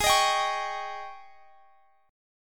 G sharp Major 7th Suspended 4th G sharp, Guitar Half Step Down